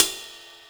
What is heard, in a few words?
CYMBAL, Yamaha RM 50